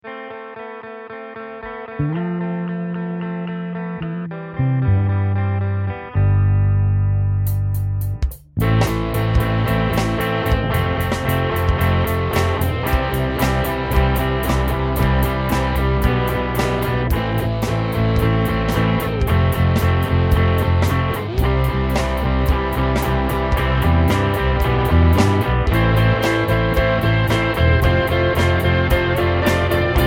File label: drums